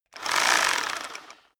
rock drill hammer rev medium; maschinen; bohrer; soundpool

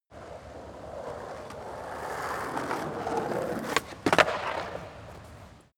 skateboard single drive by jump fail; sport freizeit

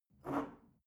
shell grab from table, gastronomie; berufe; soundpool

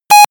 computer sound, signale; soundpool

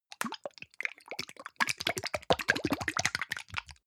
hygiene small shake, Bathroom; haus-garten; badezimmer

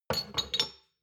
shot glasses stack slide soft; soundpool

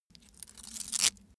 small metal scratch; industrie wirtschaft; metall

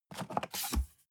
cardboard box open unfold scrape short; objekte

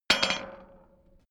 small metal drop

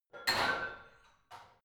barbell heavy plates lift rack reverby

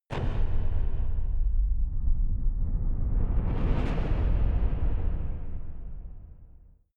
massive metal rumble

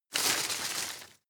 rustle medium short; berufe

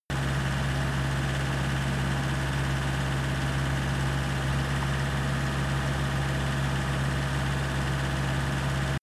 pickup engine steady 2000 rpm

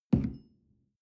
coffee place tablecloth soft

